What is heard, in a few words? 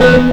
STACK25, Big Beat Samples; AKAI; STACKS KIT